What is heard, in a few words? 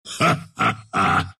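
laugh, Russian; Dota 2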